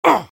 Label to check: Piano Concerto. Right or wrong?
Wrong